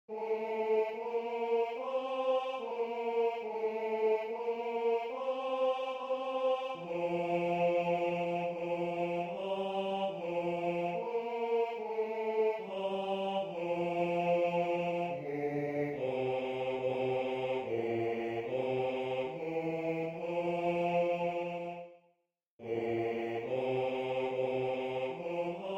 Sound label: bass